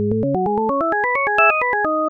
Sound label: alarm